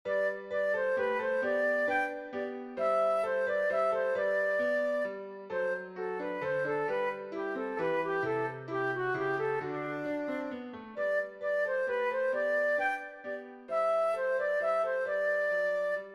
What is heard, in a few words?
flute and piano; Minuet in G